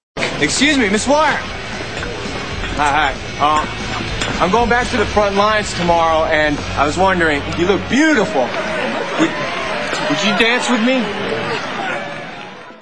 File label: barb dance